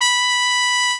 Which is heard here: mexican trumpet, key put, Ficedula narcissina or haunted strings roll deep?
mexican trumpet